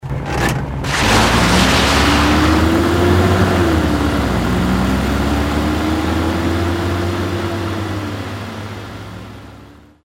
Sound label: Jeep Ride Audio; vehicle; mohaa